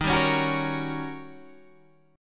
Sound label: Eb 7th Sharp 9th, Eb, Guitar, DADGAD; 13,10,11,11,13,11